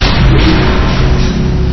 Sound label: elev start; cruisecontrol